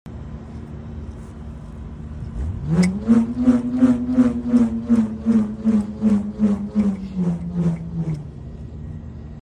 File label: Bullroarer